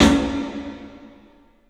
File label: CLUB SN VRB, 3D Drums; 3D Sound